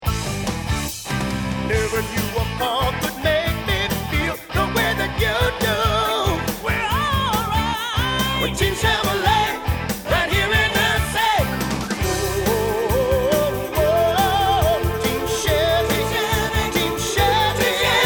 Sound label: Jingle; Team Chevrolet